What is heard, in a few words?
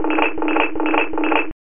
Amiga, Door, Sound; Dungeon Master and Chaos Strikes Back